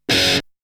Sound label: Guitar; ZERO G - Datafile DISC 1